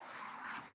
Ink Sound Stroke

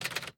Sticky Keys Locked; accessibility

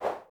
poof item off dock

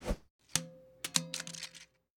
move to trash; finder

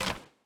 empty trash; finder; glade

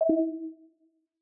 submarine, error

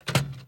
Sticky Keys off; accessibility

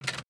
Slow Keys Key Registered; accessibility

